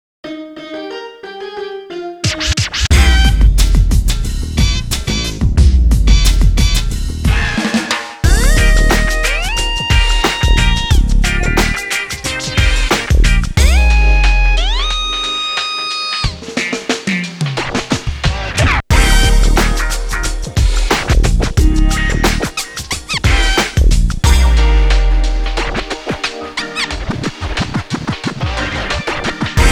jam jam reggae; beatmania core remix original soundtrack 2001

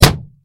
BALLOON POP; Don't Be Afraid The First Toy

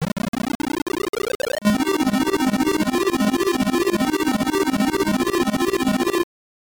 Level Start; time soldiers; master system

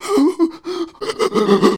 Voices Male panic

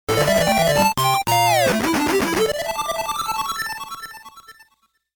Win; snk vs. capcom choujou kessen saikyou fighters; Shouri Demo

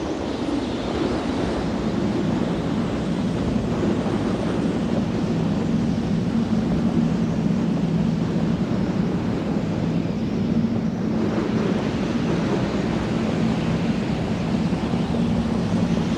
Wind northwest

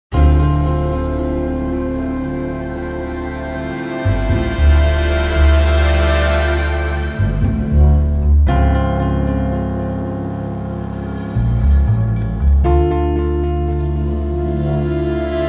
Back Alley Jazz; Desperados 3

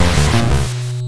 Miss; Burai Fighter Deluxe; GB

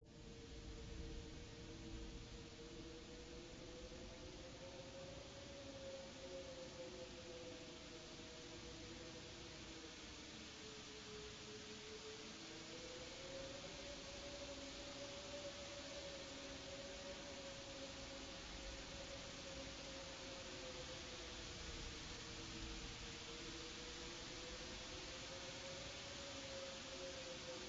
Soft Rain; Even the Ocean

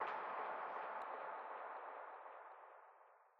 Library Perc

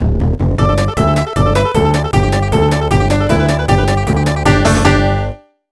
Victory Sting; nds

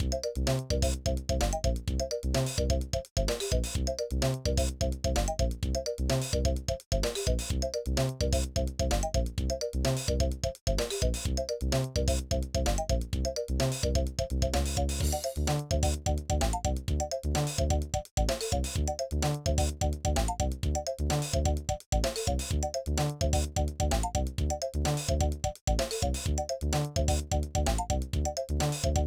BGM Test

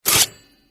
sound Spike Wall Move

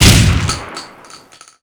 VEPR12

